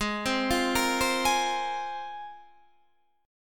G sharp 6th Add 9th G sharp Guitar DADGAD

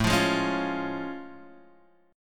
A Augmented Major 7th A; strummed; x,0,3,1,2,1; JGuitar

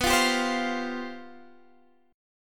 G 11th B

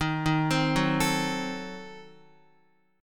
Eb Diminished 7th, Guitar; arpeggiated; x,6,3,5,6,5

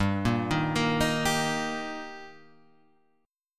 G Minor Sharp 5th G Guitar Half Step Down, chord

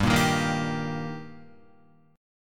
G Minor Sharp 5th G Guitar, chord; strummed; JGuitar

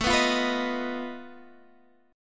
F 7th Flat 5th A

